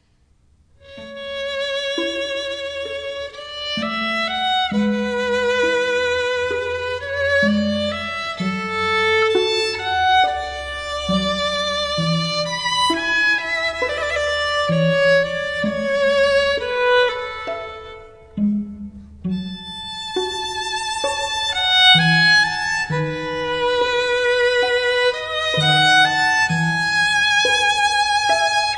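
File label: String Trio; Arioso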